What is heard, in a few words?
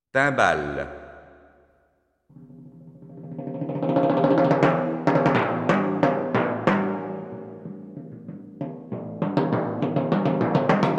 Timbales